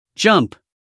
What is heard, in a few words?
jump